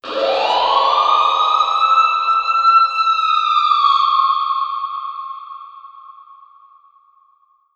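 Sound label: Reverb Proc, alarm siren samples, 120bpm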